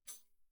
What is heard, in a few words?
tambourine normal pp; Percussion